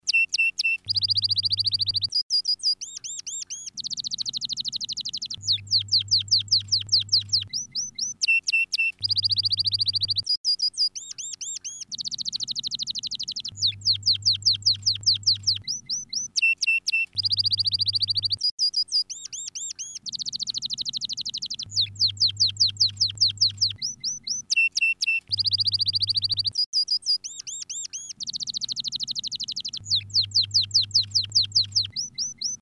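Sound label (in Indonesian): kenari fife, canary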